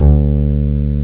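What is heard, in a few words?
acoustic bass, instruments; sonix; Rise Of The Dragon; chipmusic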